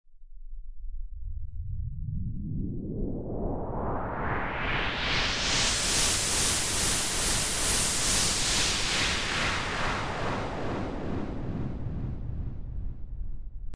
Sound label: Pink Noise Effix Delayed; Techno